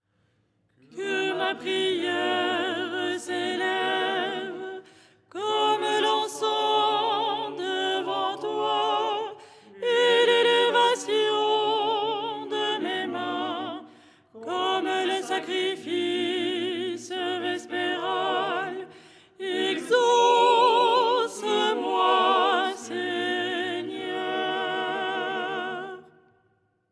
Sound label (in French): soprano; " Que ma prière s'élève…"; Les 8 tons en français; ton 6